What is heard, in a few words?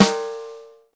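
snare rolls; Brush&Rolls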